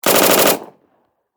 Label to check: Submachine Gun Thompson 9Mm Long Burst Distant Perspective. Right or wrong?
Right